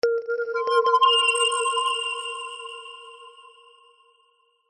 multimedia musical logo tech science water drop delayed; zapsplat